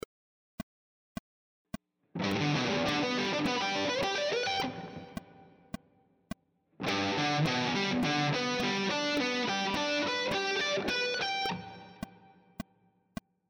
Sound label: triad arpeggio sequences, guitar